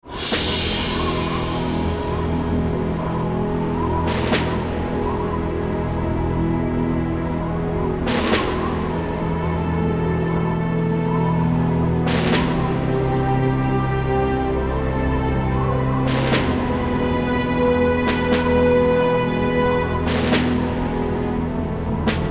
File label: Peace Relax; Serious Sam 2; Kronor Military Base